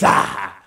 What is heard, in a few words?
"ah", Voice